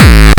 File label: kick